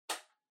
Portable Cassette Far Stop

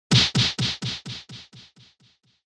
Hit Punch Delay

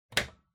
Power Strip Hard Plug In

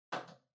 TV Hardware Far Button

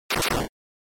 SNES RPG Damage

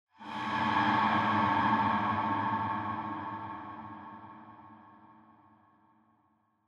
Scene Flashback Long

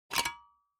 Typewriter Open

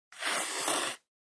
Mechanical Pen Circle

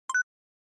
Short Accent Dry

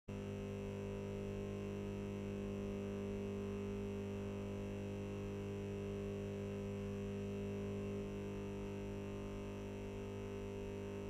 Fluorescent Light Noise Hum

